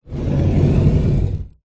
household cupboard door wooden slide low frequency; zapsplat